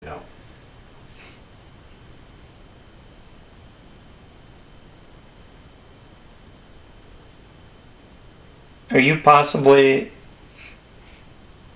Humming, EVP; Neshkoro, WI

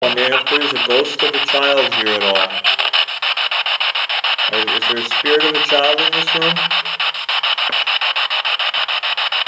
whoop sound, middle classroom; Is there a ghost of a child maybe; EVP

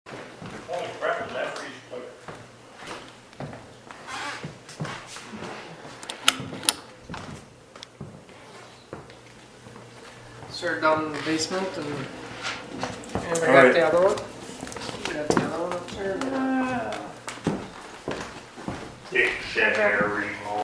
Descending Basement Stairs Moan; EVP

